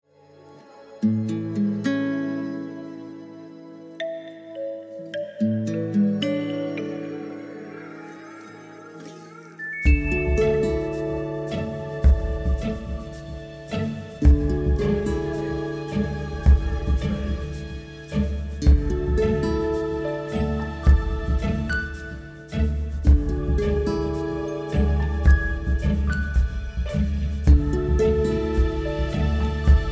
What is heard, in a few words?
Dreamy Idea Children theme